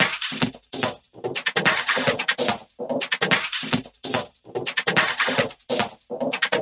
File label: 145 chatter hipass, DRUM LOOPS and BREAKS